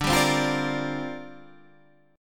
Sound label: C 7th Suspended 2nd Suspended 4th D